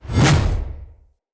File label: Spear Whoosh; Weapons; Enemy; Spears; Nimrod Spear